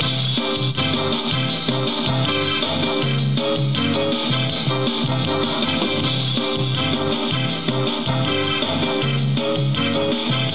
tune

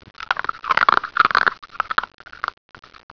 Dog Eat Ruff

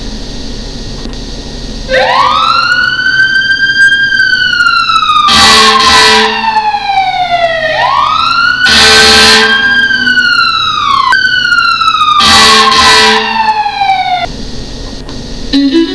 horn

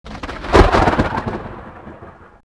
thunder

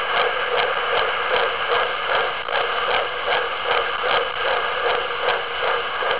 heart

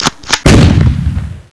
Shotgun

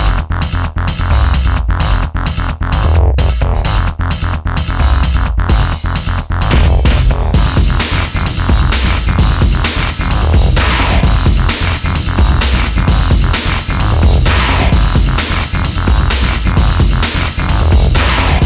Big Beat

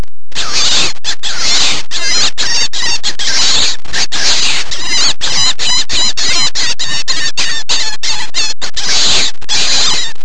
death cry fast

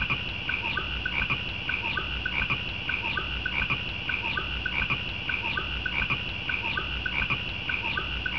crickets

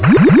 block Hit

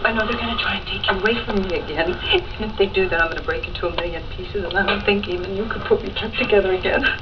break

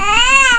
cooing

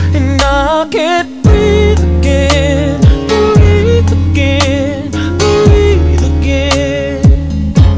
breathe

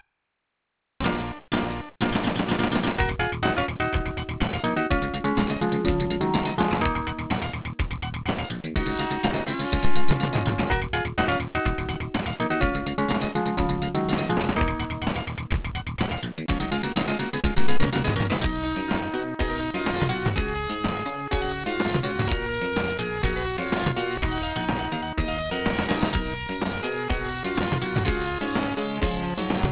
victory

